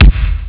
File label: roll; Amiga Soundtracker Sample Packs